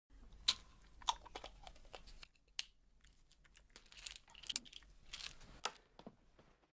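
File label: Medicine being taken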